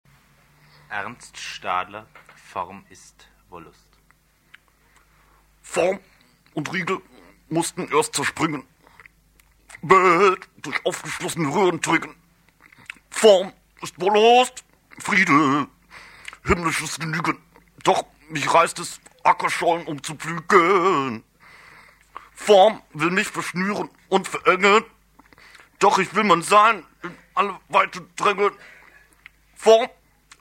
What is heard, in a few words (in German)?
sprache orch jazz